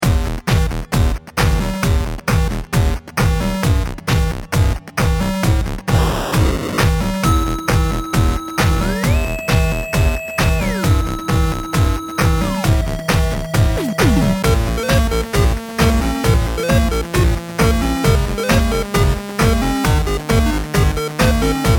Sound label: bomb planted